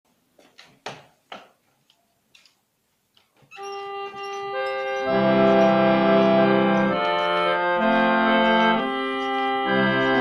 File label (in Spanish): Armonio antiguo